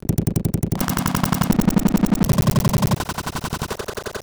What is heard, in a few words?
Loop - 170 bpm, Glitch Noise; 99Sounds; Mangling Audio